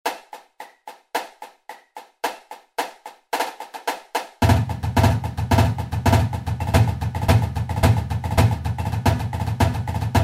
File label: Percussion Grid Pack Sample